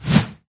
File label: claw miss, infected